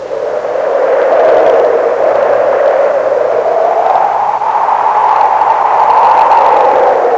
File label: sandstorm, nature